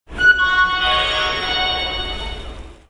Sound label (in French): Ratp jingle